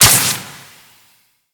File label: Jetpack Thruster; Enemies; Corpus